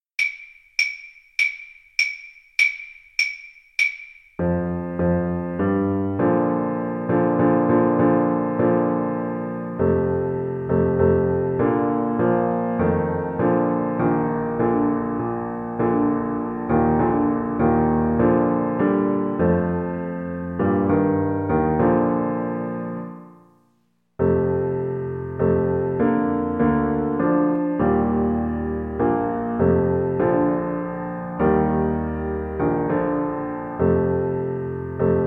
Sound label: horn, French Horn